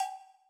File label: Cowbell